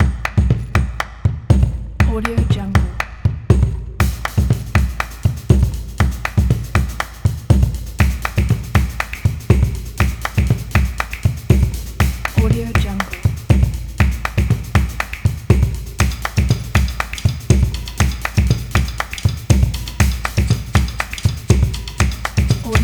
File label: stomps claps